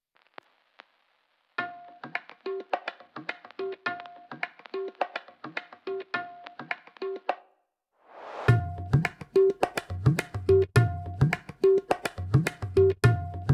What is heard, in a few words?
Beats